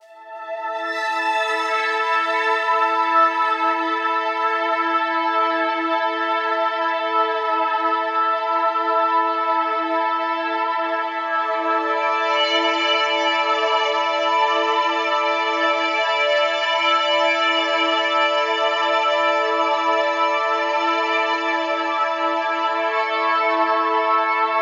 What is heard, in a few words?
Synth Pad - A 90BPM; STEMS; Ghosthack; SkyRealm; Mood Kits; Mood Kit 02 - Afterlife